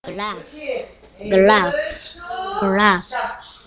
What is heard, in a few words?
glass